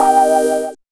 ahhwowowu; Truth-Hz - 1-Hit KO Sample Pack

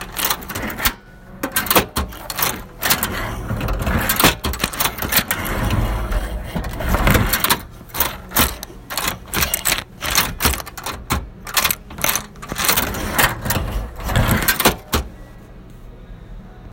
Gm Money Drawer, Foley; TRUTH-HZ; Crowdsourced Sample Pack

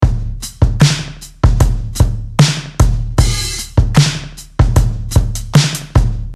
drum loop; TRUTH-HZ; Crowdsourced Sample Pack; Drum Kit

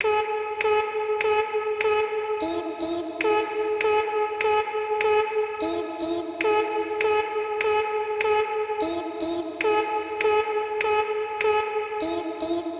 vocal main; TRUTH-HZ; Crowdsourced Sample Pack

